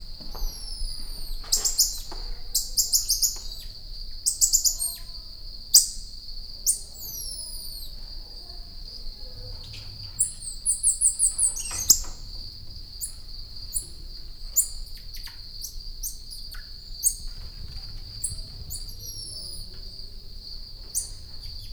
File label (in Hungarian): hatterben hazi tucskok, feherajku tamarin vagy voroshasu tamarin; debreceni zoo 2012; marantz puska